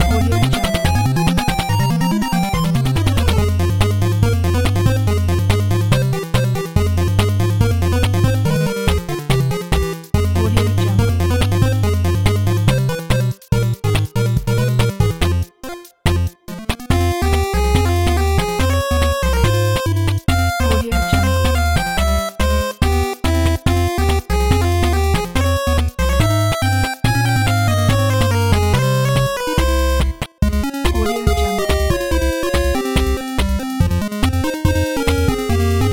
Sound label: retro 8 bit game boss fight battle